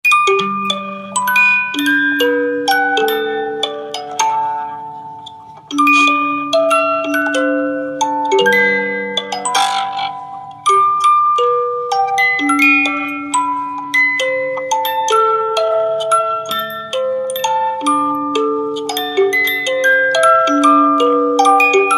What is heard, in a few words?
Music Box Barrell reverb